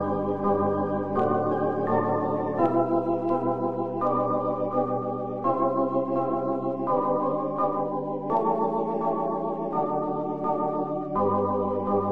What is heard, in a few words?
chorus organ